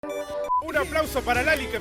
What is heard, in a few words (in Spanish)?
aplauso